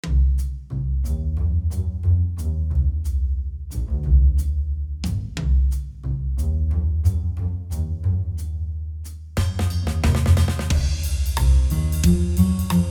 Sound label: jazzy